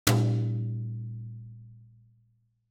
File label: ambient